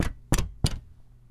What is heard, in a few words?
Bang on Shelf; BB Retail Therapy Sample Pack